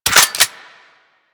Reload, Weapons; Strun

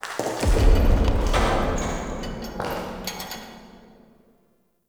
Cave Mine Distant Boom; Ambience; Midrath Island

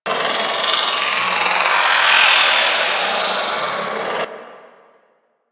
Smite Projectile Loop; Warframe; Warframes; Paladin

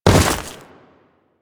Step Ice, Footsteps; Warframe; Grineer

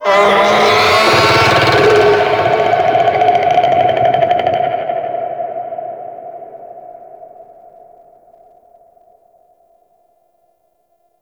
Infested Golem Vocal Attack Short; Warframe

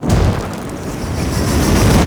Fire Vent Charge Up; Warframe; Ambience; Corpus Gas City; Gameplay Remaster

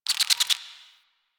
Insect, Alchemy Lab; Warframe; Hub; Liset Alchemy Lab

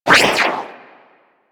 Universe Ring Bounce; Warframe; Warframes; Nezha

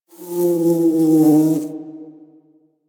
Exterior Foreground Bug Flyby; Ambience; Duviri; Warframe

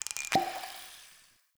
Healing Potion Pickup; Objects; Pickups

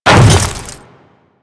Hammer Boss Step Ice, Footsteps

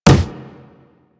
Walk Wood, footsteps; Warframe; Operator

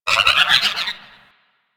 Venus Landscape Cave Creature Calls; Ambience; Warframe; Venus Open World

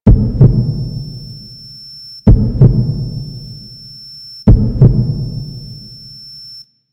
Parasite Infection Beat Ring

